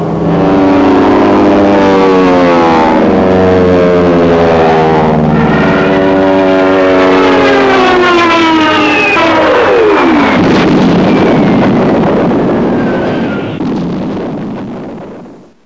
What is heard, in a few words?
stuka, aircraft; warbirds